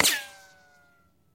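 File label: whistling arrow shot; svistyashij vistrel streli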